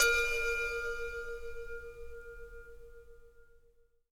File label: Indian Bell